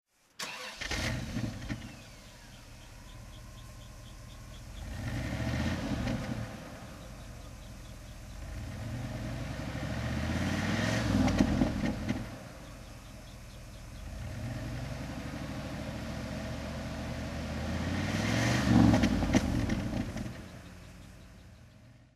rolls royce silver spirit throttle, Rolls Royce Silver Spirit II, Engine sound; 1994; V3D/ ClassicarImages